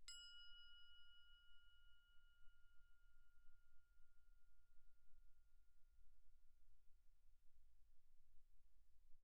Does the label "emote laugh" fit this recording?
No